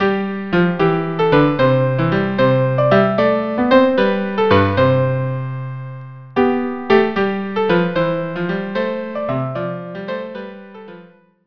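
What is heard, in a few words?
violin with cello; Sellingers round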